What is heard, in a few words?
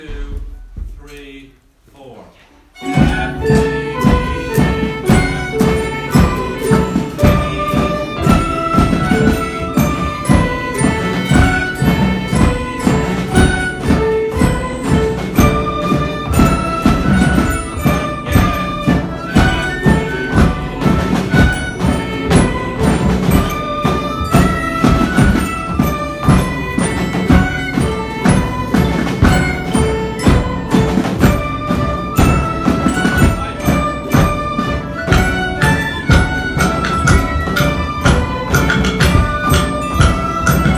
Kerry Polka Band